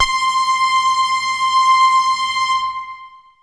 Techno leads, hits and riffs

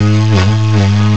DIGAREDO, WIND; CMI Sound Library Rev 1.3

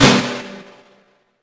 SNR, Drums; Polyend; Simulacrum Sounds; 1 Shots

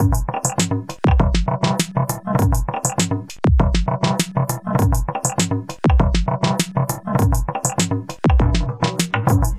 Log Drum Beat; Sonic Foundry (Sony Creative Software); Myths of Technology; Composite Beats

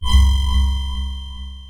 Sfx, Trance; Zero-G Trance Inducer; General Sfx

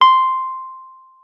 WURLITZER, C6; Xsample VOL-1; 90 s Sample CDs; WURLITZER RS

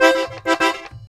Accordion Rhythm 106 C; Zero-G Kocktail Kollection; Accordion Lix

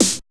SD Dance

